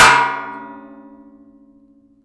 shovel

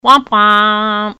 womp womp

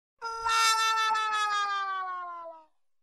unfunny joke anime sound

